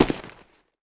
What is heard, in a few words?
concrete, footsteps; Counter-Strike Global Offensive